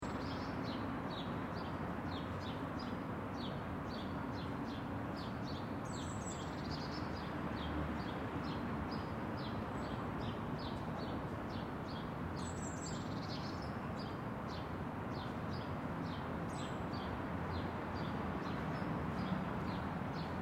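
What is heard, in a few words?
Birds Calm Environment Distant Traffic; Springtime with residential birds and medium distant traffic in distance.; Outdoor Sound Effects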